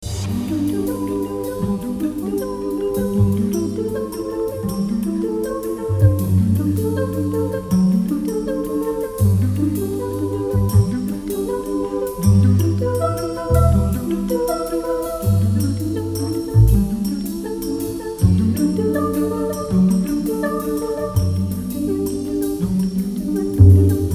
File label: Prelude & Fugue In C Minor; chill